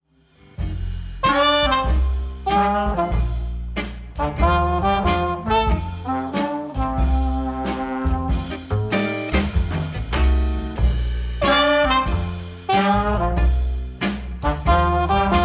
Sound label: Bluesy life